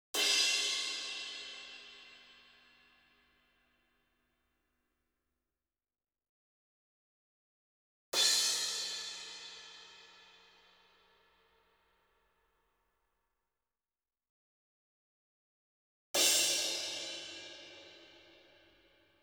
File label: crash 1-3 stick; Sound Sampling Collection VOL-5; Drums & Bass Fusion Funk Effect